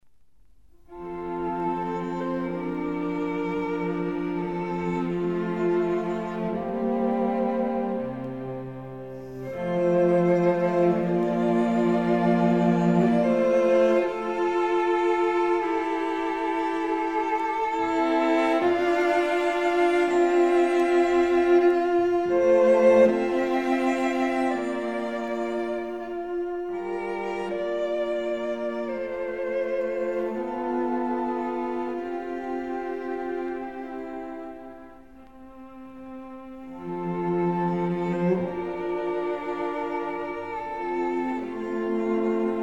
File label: intermezzo; parry